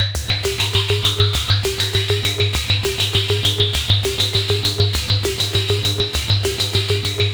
WHITE NOISE BT; Zero G; Malice In Wonderland

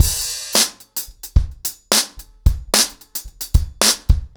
110BPM, acoustic drums, drumloops

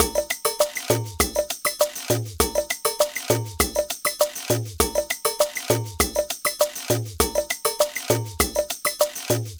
Ensemble, Latin Percussion

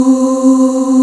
VOX C3, Vox Percplex'd

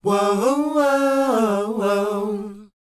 WHOA, R&B & Dance vocal; ILIO; Vocal Planet

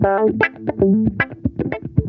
DIZZY, Funk Guitar; 90 s Sample CDs; Zero G

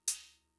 Cymbal; Fricke MFB-522

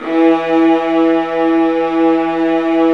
STR, Vas2 Bright; Roland L-CD702; Vlas Sect1-2

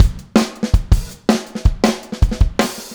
Full Mix, Drums, 162 BPM; Verse

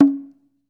ONOG, Ritual Drums; E-MU Producer Series Vol. 7 – Old World Instruments

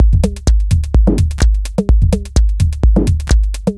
C 127bpm, House Drumloops; Alive; Zero-G House Fabrik; 90 s Sample CDs

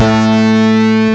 PIANSYN1, ANALOG; M8; CMI Factory

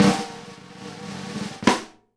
snare ROLL HIT, Live Snares

